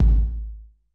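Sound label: wet room kick 909; Masterbits - TR-Minator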